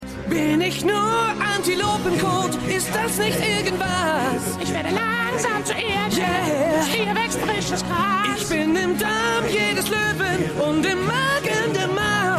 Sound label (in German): Gesang; PICKET FENCES - TATORT GARTENZAUN; Cornwallis